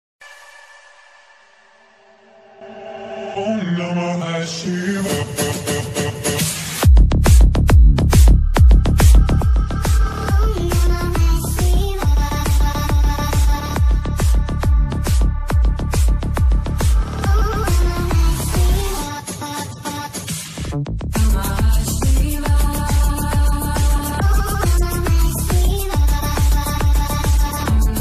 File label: mahadev ringtone om namah shivay ringtone mahadev dj remix ringtone